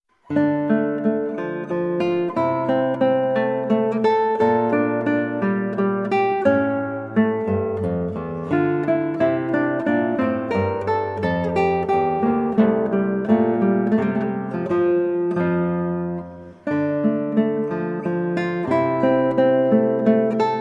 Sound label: Sarabande in D Minor, Guitar; Sarabande 2 from Suite in D Minor HWV448; George Frideric Handel